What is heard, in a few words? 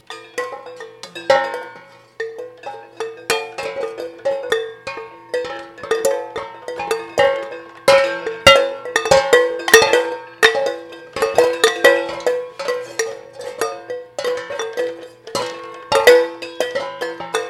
empty pans banging; 60 seconds of empty pans banging